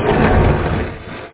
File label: Door Close